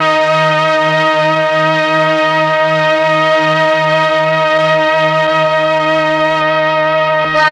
MEL STR, Legendary M400; ILIO; Propeller Island

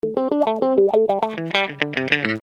Wah Guitar

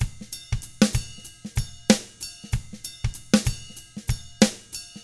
95 funk, drums; ILIO; Double Platinum Drums 1

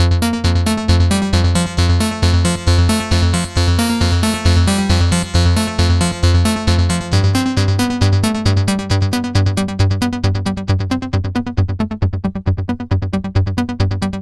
synth arp; Electrocution

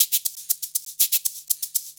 120 SHAKER; Best Service Advanced Media Trax Modern Composer

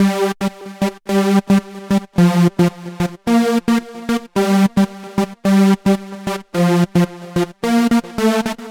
Club Line, Arpeggios & Sequences; Dr. Fink's Funk Factory

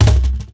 MIXED DRUMS, World Instruments; Best Service ProSamples vol.52 - World Instruments 2; AKAI

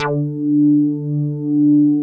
JX HARD D4, HARD BASS; Drums In Your FACE!

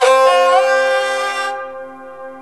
sarangi riffs

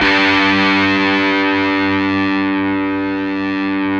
GTR, Dirty; Club-50 - Foundations; Paul-Stack

